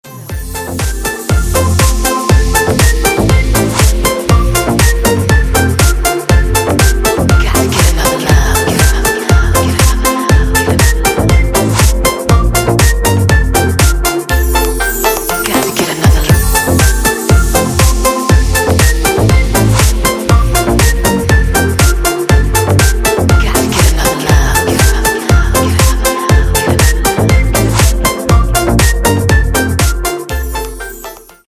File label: christmas jingle